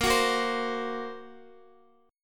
A sharp Suspended 2nd Sharp 5th A sharp down Guitar; strummed; 4F,4A,5C,5G,5C,6E; JGuitar